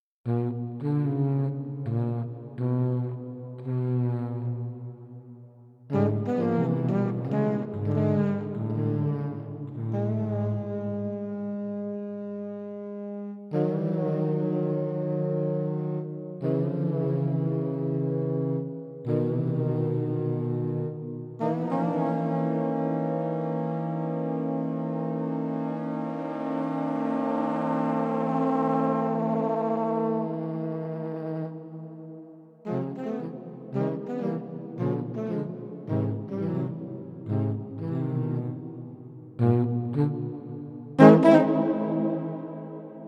celtic era; eduardo tarilonte

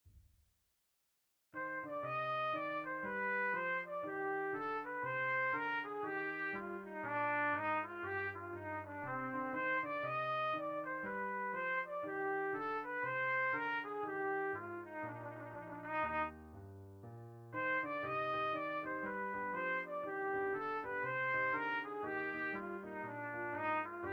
Brass Trumpet; Sonivox; Film Score Companion; Orchestral Companion Brass

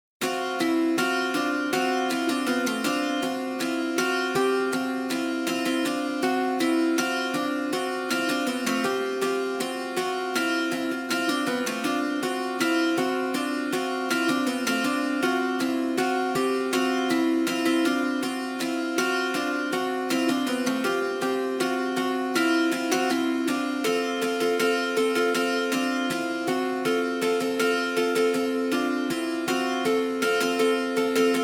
dulcimer; Mountain Dulcimer von Embertone

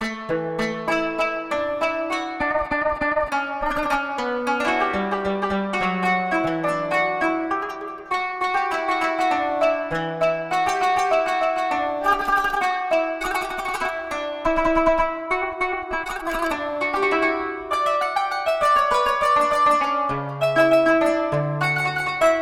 qanun; indien; Basam Ayoub Qanun

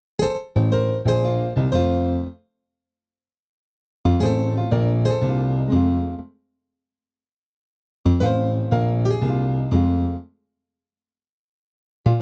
piano; eiosis

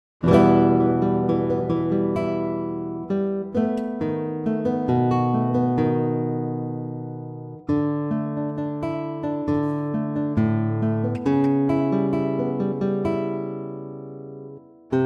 pianoteq; Modartt; Pianoteq 8

